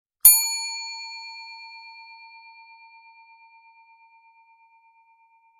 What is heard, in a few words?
service bell hotel bell restaurant bell stereo, 96khz